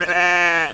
sheep

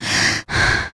inhale; Serious Sam II; Player; Female

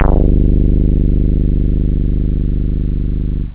buzz, synth

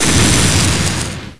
lightning bolt, magic; Net Power 2001 #2

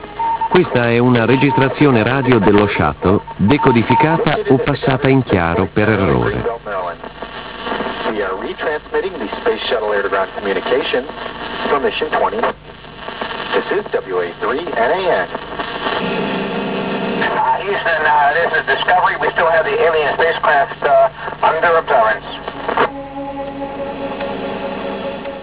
shuttle

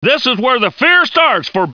FEAR

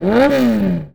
START; Puzzle

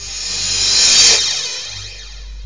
REV CYMB; Groovy Bytes: Behind the Moon; BAS PERC

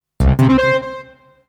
D+B 160; eJay

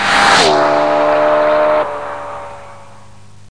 bike; Duke Screw'em 38D

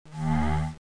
whawa

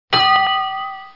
ERROR BOX

